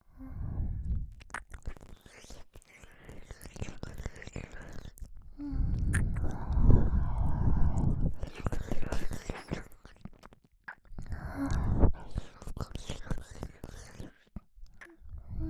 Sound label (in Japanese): ねっとりえちえちな耳舐め